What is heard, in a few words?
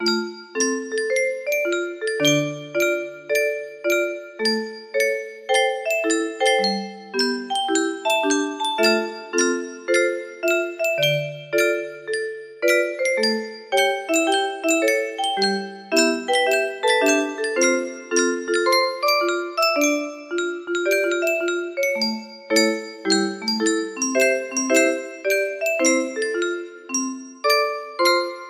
melody